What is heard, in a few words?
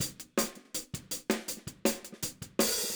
Hi-Hat, 162 BPM; Verse; Drums